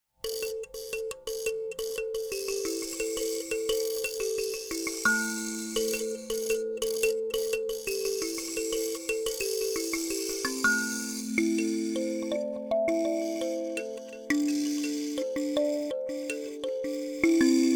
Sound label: Lotus Box Am Em, kalimba